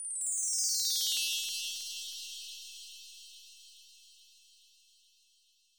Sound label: wind chime